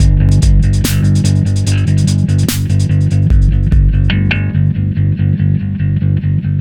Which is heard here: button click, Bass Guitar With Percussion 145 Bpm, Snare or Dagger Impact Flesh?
Bass Guitar With Percussion 145 Bpm